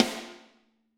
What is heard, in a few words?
Snare Crack